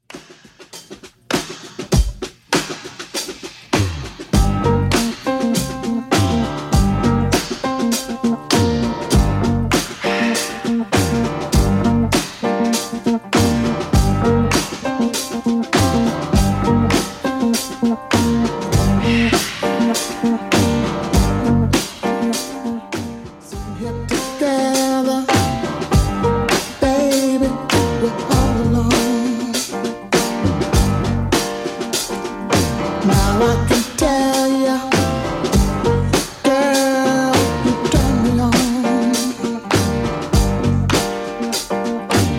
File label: 70s Redrum 100bpm